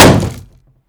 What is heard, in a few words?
shield light block